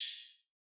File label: Ride; Cymatics; Eternity Sample Pack; Drum One Shots; Cymbals